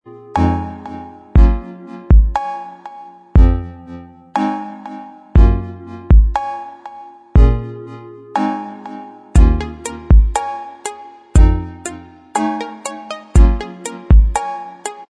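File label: instrumental, backing tracks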